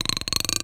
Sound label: Bolt Base Rub, Medium Wine Glass